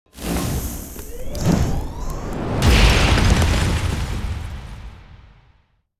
Full Crush; Badger

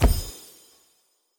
Hit Morale

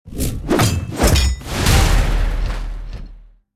Chastisement; starbites

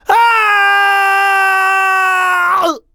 Enemy Generic Human Death Falling

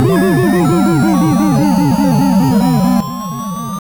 Death; Minskies Furballs; Amiga

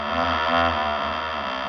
Windmill Creak

